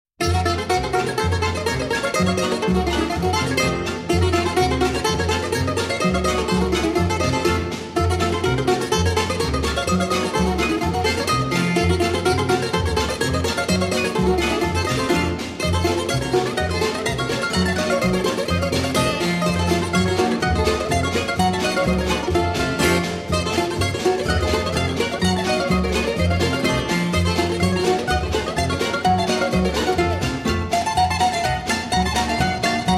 Allegro Bouzouki

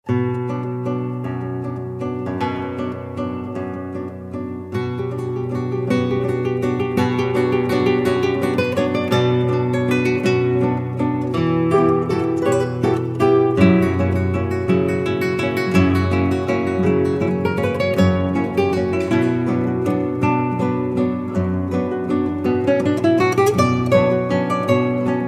Waltz (Instrumental)

